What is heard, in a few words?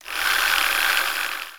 16mm movie; Spelling Made Easy